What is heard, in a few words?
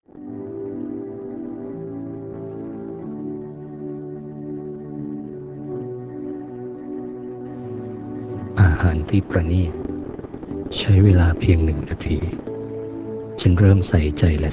Meditation